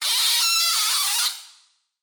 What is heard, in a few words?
monster